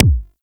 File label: SMG Punch, Weapons; Warframe; Tenno; Protea